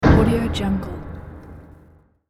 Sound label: fantasy cell closes